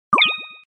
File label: warning